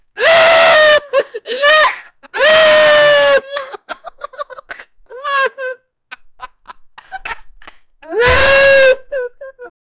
crying, tearful

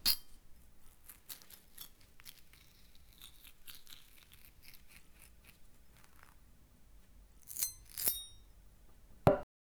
biting biscuit

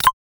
western styled ui click

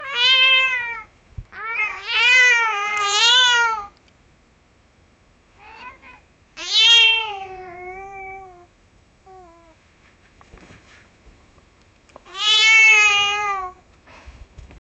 cat calls her kittens meo

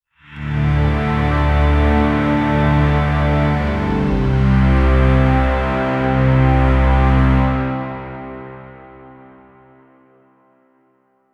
drama sound background

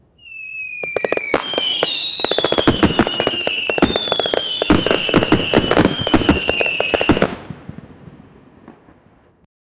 exciting fire, burst

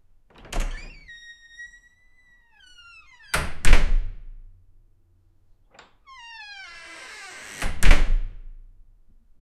door

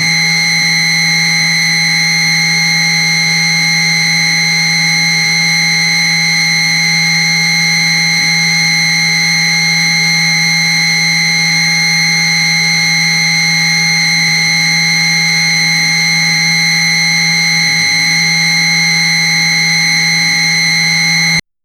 a smooth buzzing vibration

